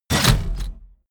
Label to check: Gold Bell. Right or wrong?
Wrong